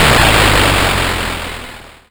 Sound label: YM Noise; NOISES; ChipShop Deluxe; ChipShop Hits